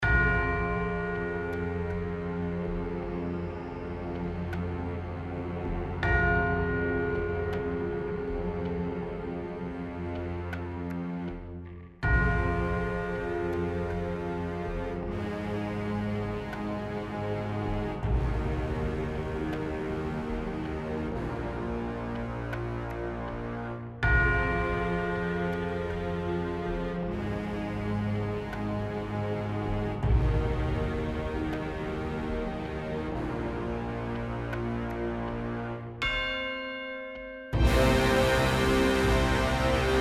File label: laning layer, music